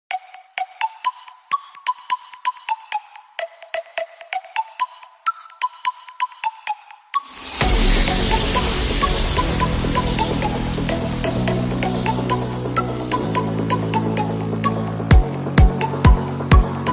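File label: unplugged; iphone 7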